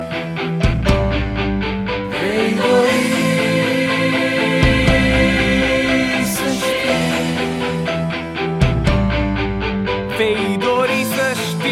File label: RNB